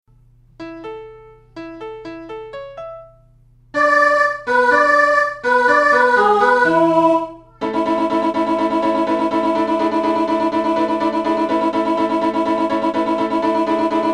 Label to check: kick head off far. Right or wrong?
Wrong